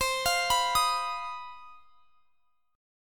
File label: C Minor Major 7th Double Flat 5th chord; CmM7bb5; arpeggiated